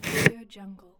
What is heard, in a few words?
musical equipment fader slide